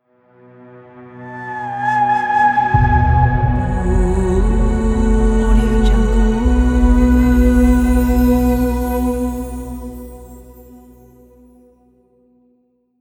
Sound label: magical flute voice logo